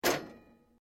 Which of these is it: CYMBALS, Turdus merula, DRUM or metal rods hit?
metal rods hit